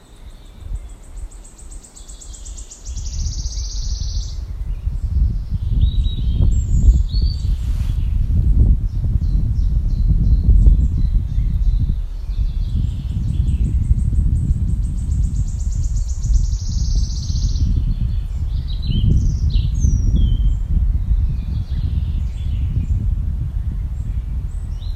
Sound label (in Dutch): Fluiter; Oranjezon Matthijs Broere